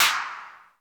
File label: TEK.P, TECHNO; 90 sSampleCDs; Crypton - DrumBase5000